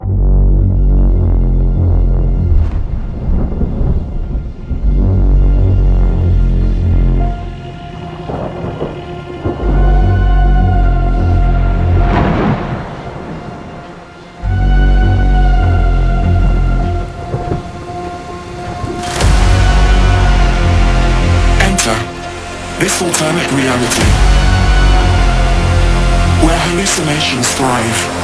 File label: Uptempo and Hardcore; On Point Samples; Uptempo and Hardcore Essentials Vol.1; Trailer